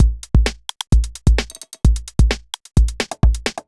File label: fast break 130